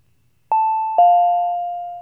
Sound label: chime